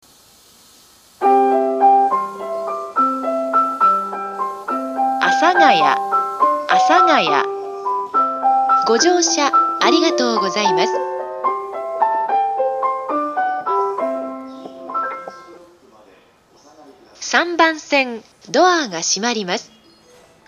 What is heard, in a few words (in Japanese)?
発車メロディー（たなばたさま）, ※音声には到着放送が被っています。; 阿佐ヶ谷ＶｅｒＣ; 曲は「たなばたさま」です。; ダイヤが非常に厳しいため、混雑時でも滅多に最後まで流れません; ３番線